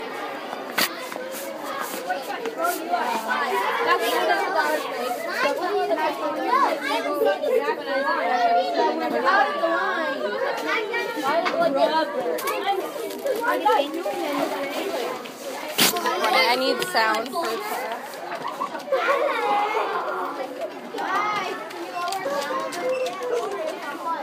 Vending Machine; Location: Outside EMT School on break

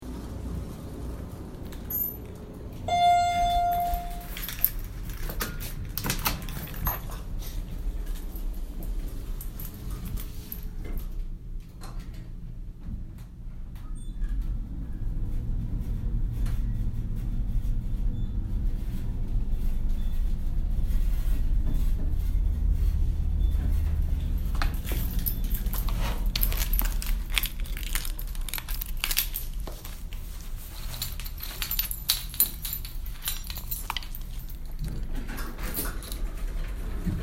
Elevator

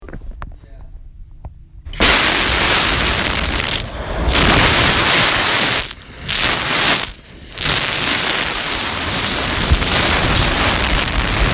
Running Water; Location: Bathroom in Vander Poel Hall

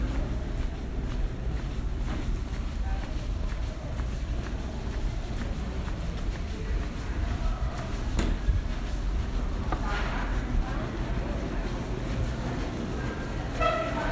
Walking through The Student Center

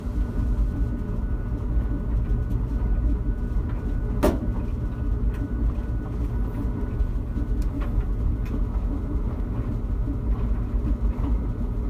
Laundry

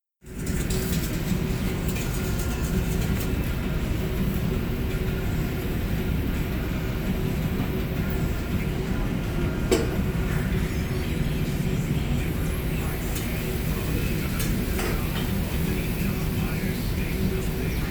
Cafe

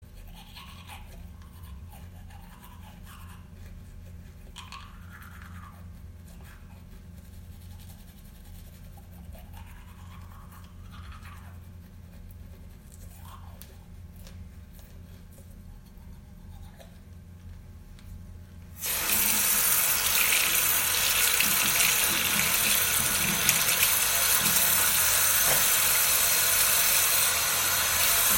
Brushing my teeth

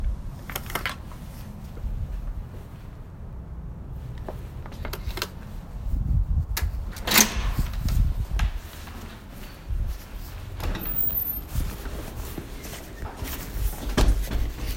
Doors

